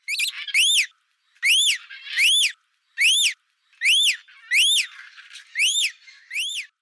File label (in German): Allfarblori Trichoglossus haematodus; Vogelstimmen